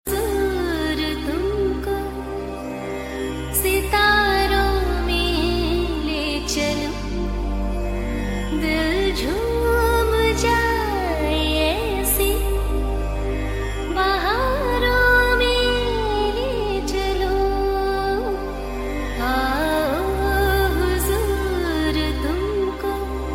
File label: funk ringtone